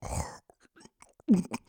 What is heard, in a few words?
burp; minecraft; SMCNV-pack